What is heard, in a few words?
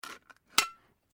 Can Metal Ringpull Lid Remove; various metal cans; Materials